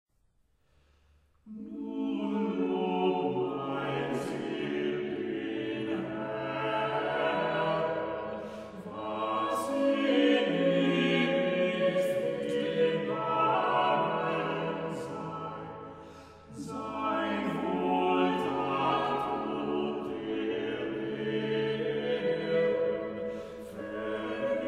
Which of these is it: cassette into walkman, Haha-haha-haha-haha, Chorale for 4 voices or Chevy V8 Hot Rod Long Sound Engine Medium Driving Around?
Chorale for 4 voices